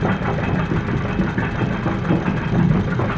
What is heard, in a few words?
Cannon Rotating Loop; Rabbids Big Bang; Android iOS Windows gamerip 2013